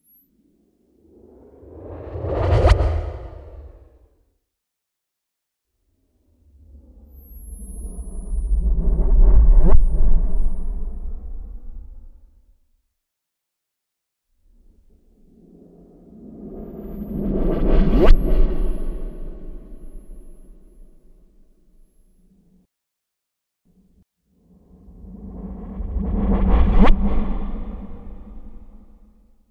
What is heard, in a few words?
Swirly Swooshes